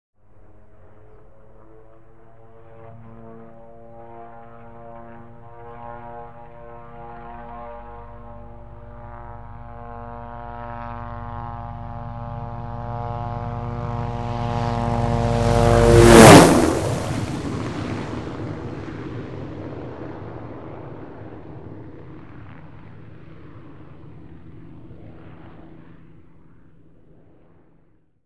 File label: Hughes 500 Single By, hughes 500